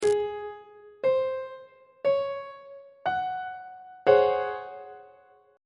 c sharp major 7th suspended 4th 2nd inversion on treble clef